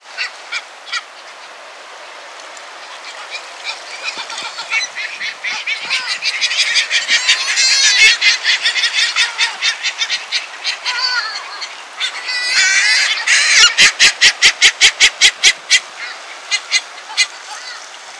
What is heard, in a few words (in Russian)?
chayka heermanna larus heermanni